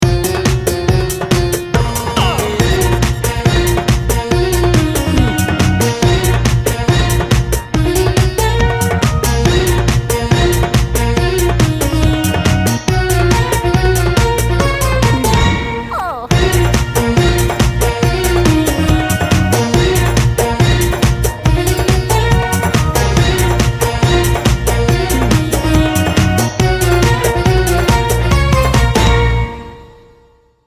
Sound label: bollywood bash